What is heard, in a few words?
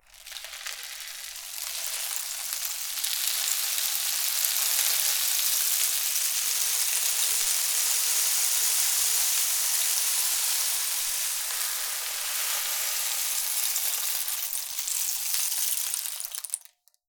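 rainstick low, percussion; ILIO; Synclavier Percussion 2; World & Orchestral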